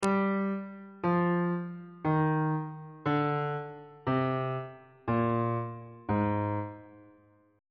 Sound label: g sharp lydian mode descending on bass clef